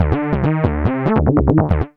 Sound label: B LOOP; E-Lab; X Static Goldmine 1